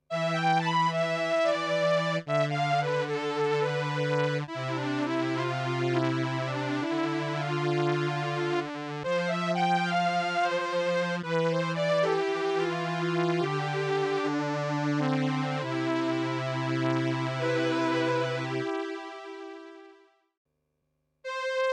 strings monotone

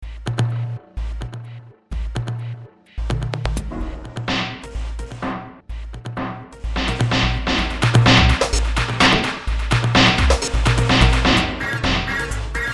Myocardium Kit

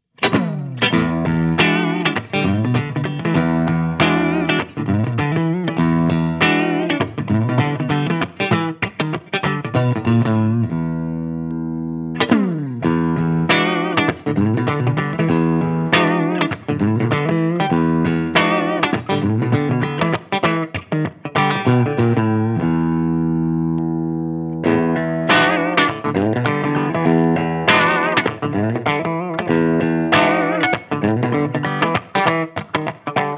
Cort KX300 Clean Neck Both Bridge

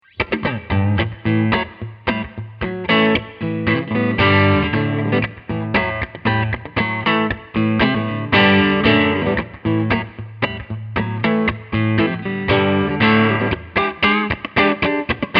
Clean Reverb; One Control

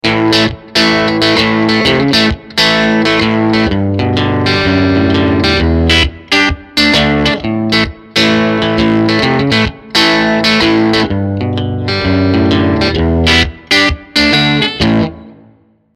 Fame Classic III Bridge Pickup

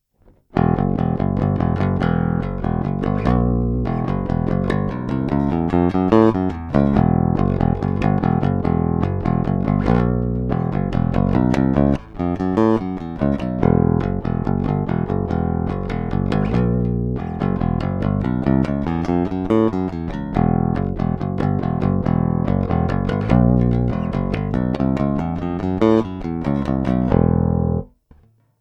Cort B4 plus AS Plec active

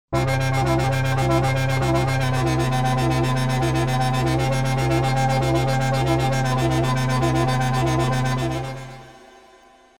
Modular Dance; Cobalt8

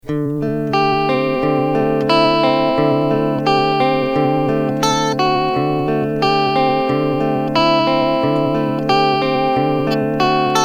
Vibrato

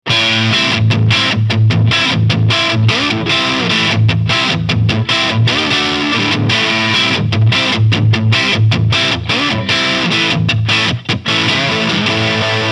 Framus Idolmaker 5R Lead

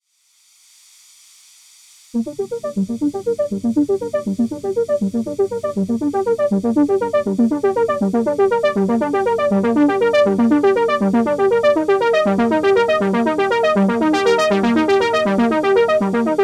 Juno X; Velvet Audio

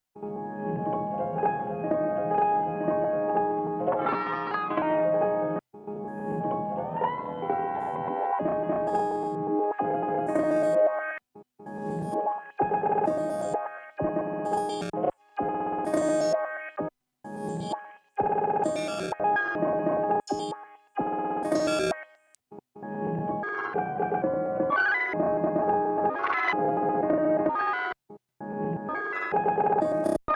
Roland E-4 Voice Tweaker Piano Glitch

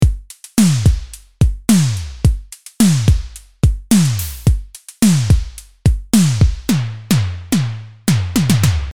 Hex Drum Punchy Eighties

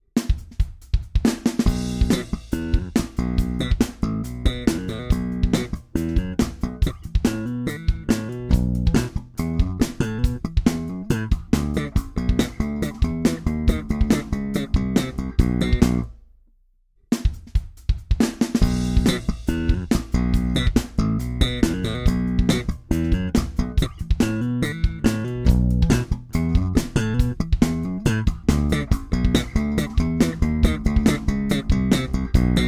Slap; Slap it like Beckham

